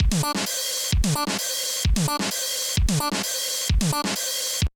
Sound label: kawai k1 OMNIBUS LOOP; wavetables